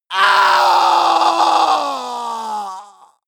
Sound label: male combat die